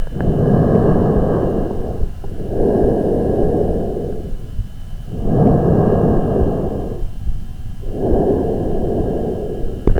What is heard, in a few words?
lung